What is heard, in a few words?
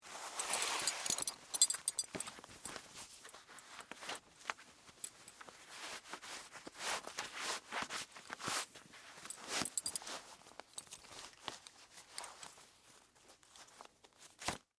Belt On, Foley, Clothes, Belt, Put, On; Dressing Sound Effects; Human